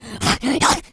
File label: rage, infected, female